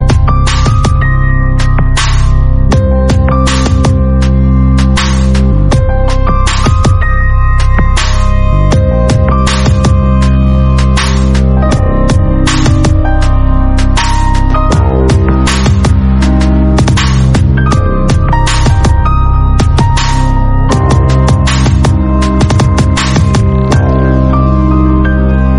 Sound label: Romantic Love BGM Ringtone